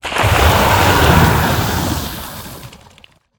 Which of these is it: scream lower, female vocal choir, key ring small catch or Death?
Death